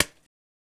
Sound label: Short foley